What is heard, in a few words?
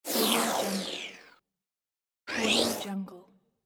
start finish whoosh